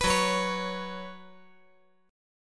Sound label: Gb Suspended 4th Gb, down